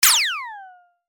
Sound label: long beam; pyuun